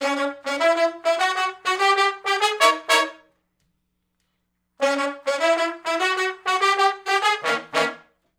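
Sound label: R&B Riff (C#min) unison to harmony, Horncraft; Sony Creative Software; Crimson Blue and Fabulous Horncraft 4 RnB; Horncraft for R&B; Sections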